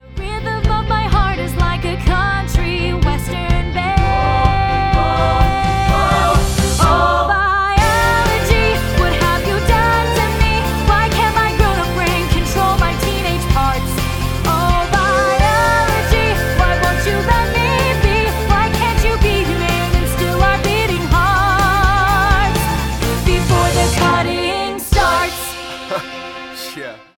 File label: Vocals